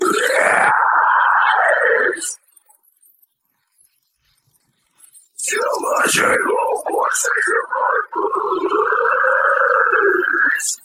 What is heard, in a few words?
vocal